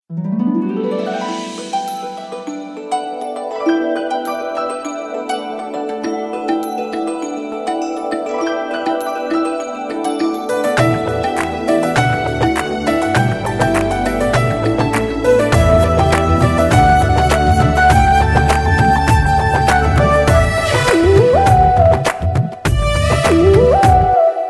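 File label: Piano Clap Flute Rhythm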